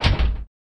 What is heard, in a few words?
Car Door Slam Int Hit